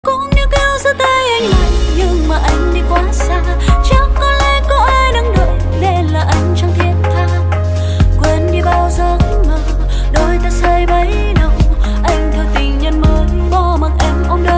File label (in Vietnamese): Nhac Chuong Cover